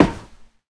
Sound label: Run Metal; scpcb-godot